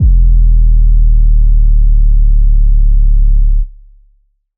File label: phonk kicks oneshot 808 e; kick drum